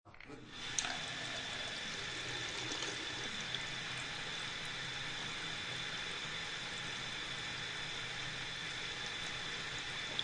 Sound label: Faucet Bathroom On Run Turn Off, Household, Faucet, Bathroom Faucet, Turn On, Run, Turn Off